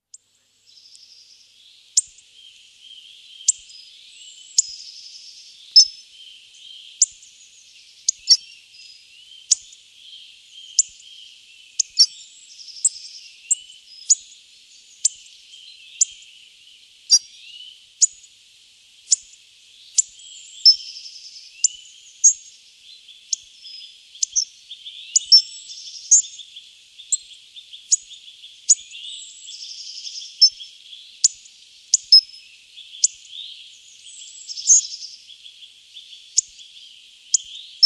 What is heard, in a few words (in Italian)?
frosone